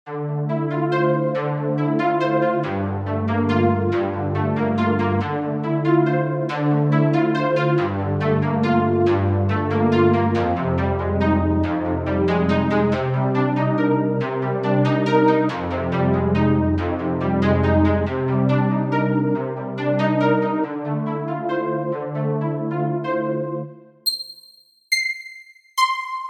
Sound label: Noise Saw, struck instrument simulator